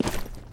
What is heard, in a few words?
mud, footsteps; Counter-Strike Global Offensive